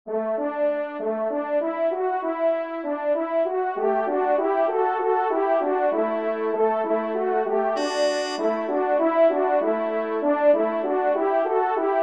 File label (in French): Fanfare Do Maj, trompe; 1e Fanfare; Corrette